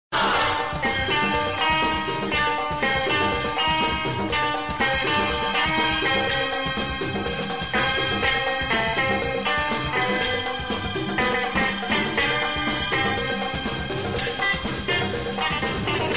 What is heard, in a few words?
instrumental; karz